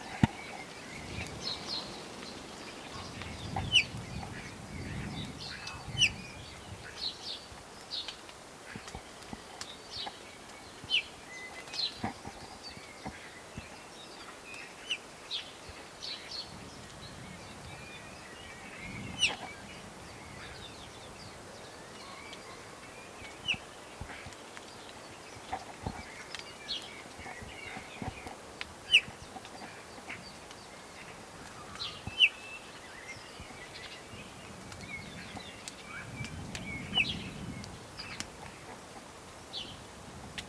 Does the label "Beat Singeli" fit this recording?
No